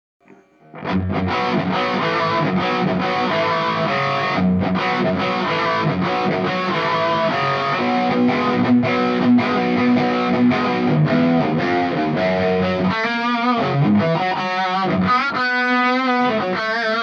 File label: echoplex switch hybrid